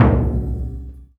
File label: LOUD DRM, BURUNDI; Spectrasonics; Heart of Africa